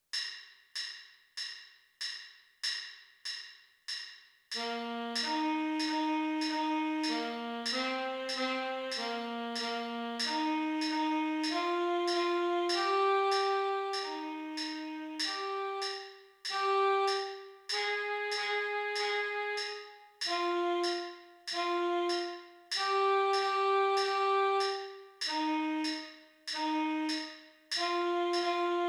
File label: bingo tenor sax